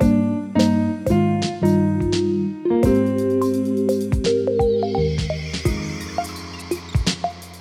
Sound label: Piano Sync